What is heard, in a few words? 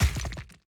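player Gospel Damage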